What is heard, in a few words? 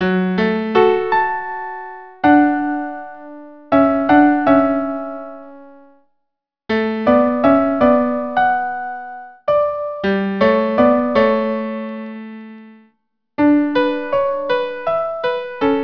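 violin cello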